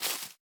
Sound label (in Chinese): 杜鹃树叶踩踏声